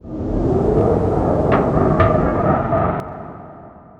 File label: fur army channel; weapons; dota; monkey king